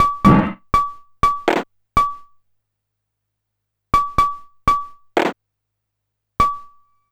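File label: EAST percussion, drum loop; Big Fish Audio; Uncivilised G'rooves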